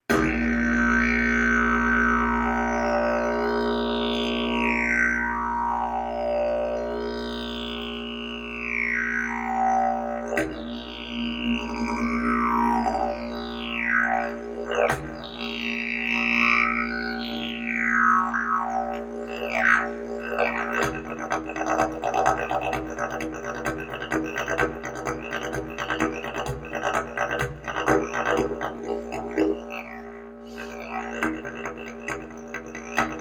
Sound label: didge